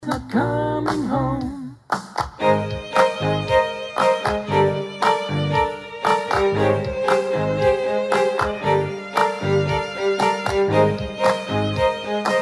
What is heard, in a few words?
prayer violins